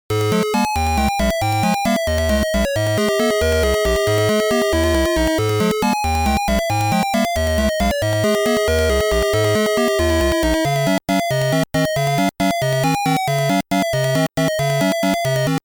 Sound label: (Field - Food Empty)